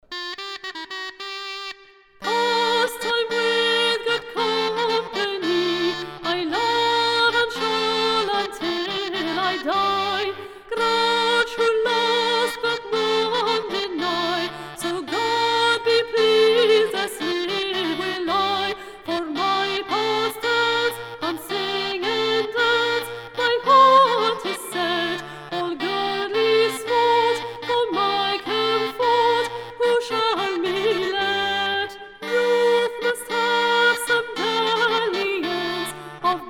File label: solo; pastyme with good companye